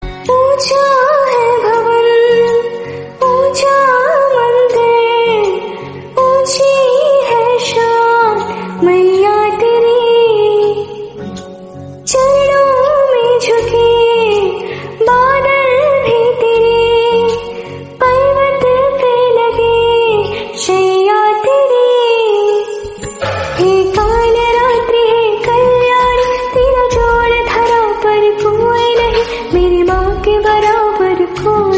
Female Version Ringtone

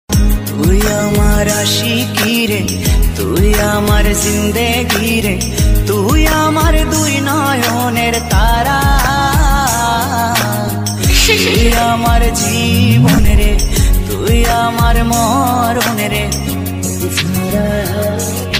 Bengali Romantic Ringtone

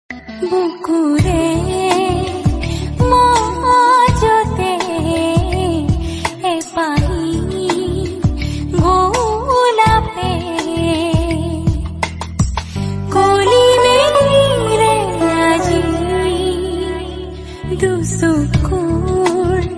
Assamese Bengali Song; Ringtone

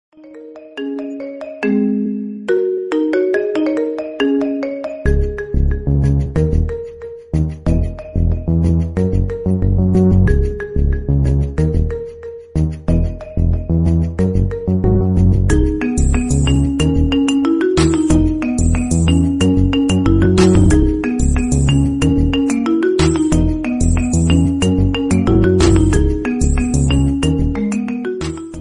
Nice Remix iPhone Ringtone

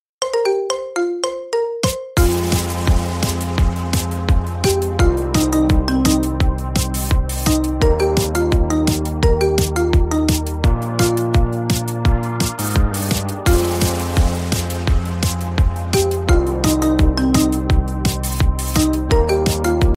Instrumental Music Ringtone

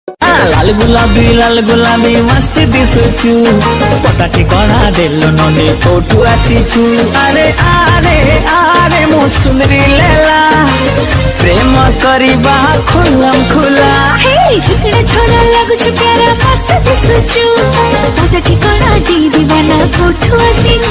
Bhojpuri Song

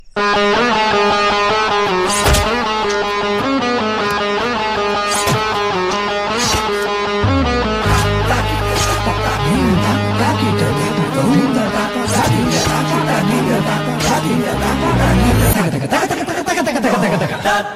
Theme Ringtone; Uriyadi 2

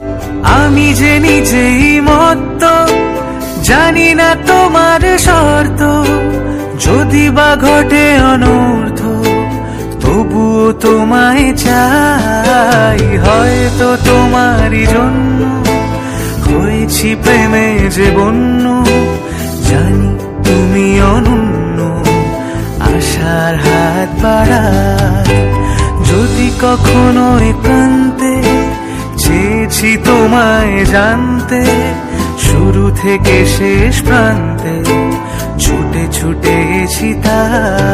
Romantic Bengali Song Ringtone

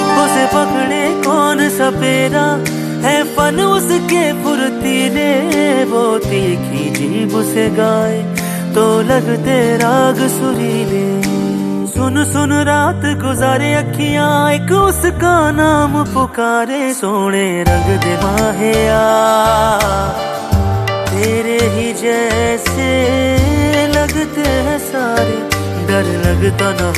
New Bollywood Ringtone

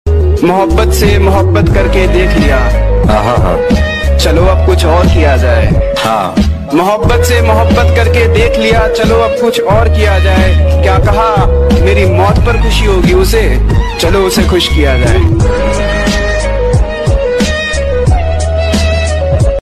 Breakup Ringtone

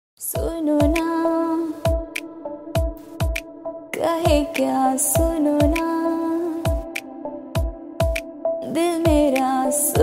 Romantic Hindi Song Ringtone

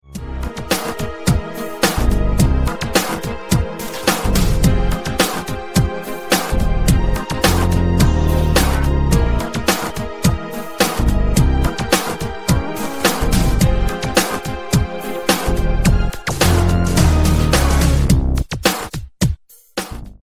Ringtone; iPhone

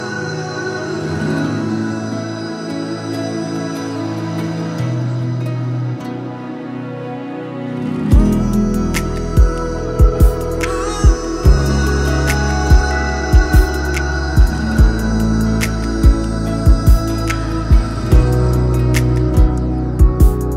iPhone 7 Music Ringtone; ringtones

